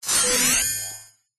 science fiction machine door open

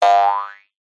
cartoon bounce spring jaw harp

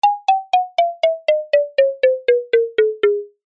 cartoon descend mallets high to low slow; zapsplat

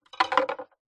musical violin bow set down on violin

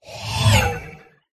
whoosh; Audio Mangler

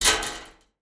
pigs dried food throw into trough

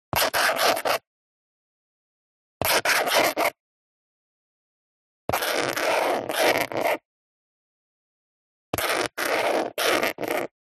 Handwriting Scratch Art Short; D&H; soundbox library

